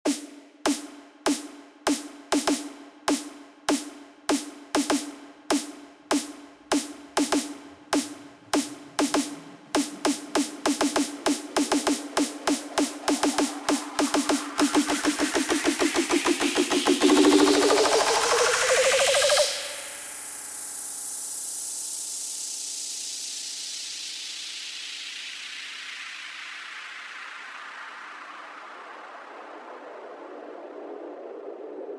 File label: Snare Roll